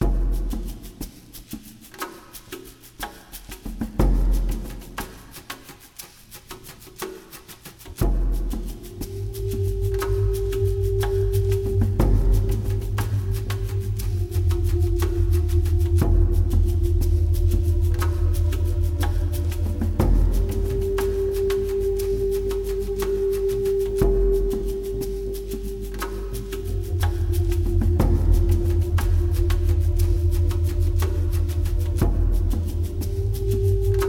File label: Kaiowa Farm mit Percussion